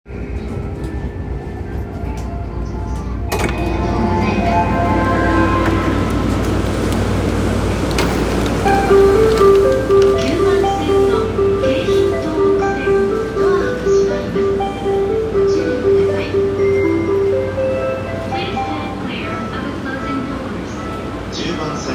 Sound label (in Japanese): JR 山手線 日暮里 CW, train sounds